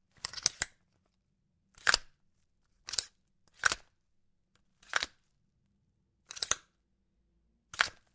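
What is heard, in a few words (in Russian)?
звуки подзорной трубы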